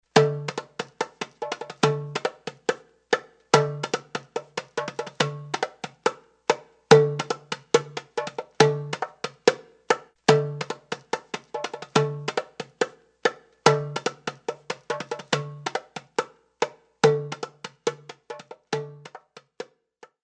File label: darabukka